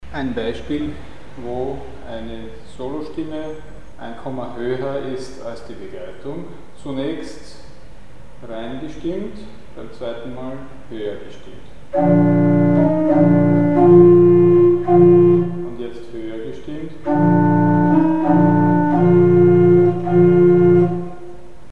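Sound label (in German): Mikrotöne in Klassik Oberstimme 1 Terzkomma höher; Mikrobuch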